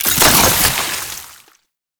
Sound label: Alchemy Grenade Pickup Cold; Entrati